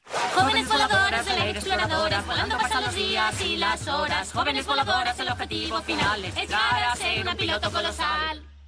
(Spanish); Junior Speedsters Chant; Season 1

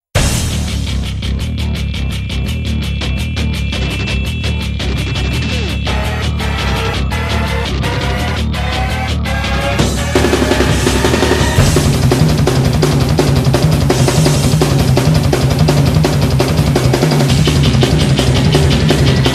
drums furious

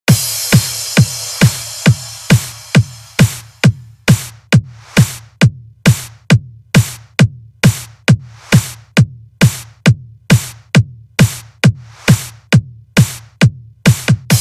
Uplifting Trance Extended Remix

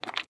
Sex Slide; Lewd SFX

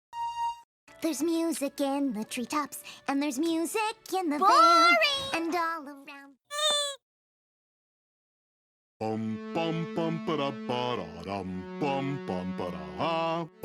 Synthpop Remix

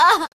Short Scream; Lewd SFX

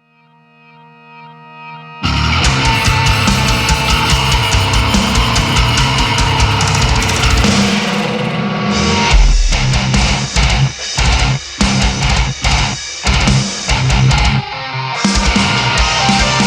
Melodic Metalcore Version, Instrumental

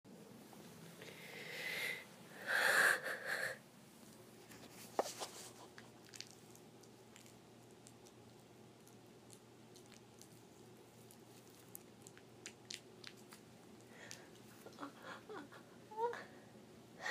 squishy sounds, wet noises, moans, snek sounds; F4A; GWA Sneky Sounds(tm)

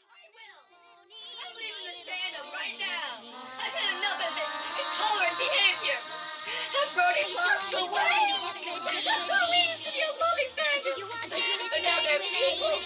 Techno, prelude; Dammit Larson

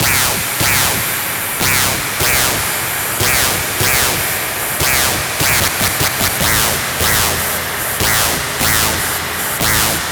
extreme techno; Pony Music Archive

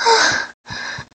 Moan

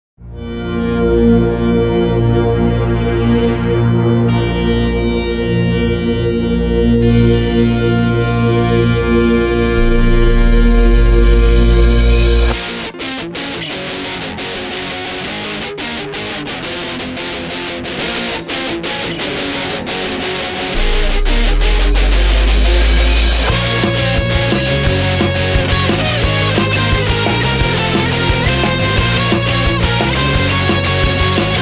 Instrumental

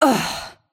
Grunt; Lewd SFX

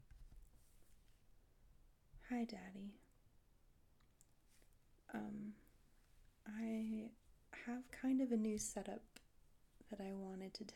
F4M, Moans, Swearing; For Training Purposes

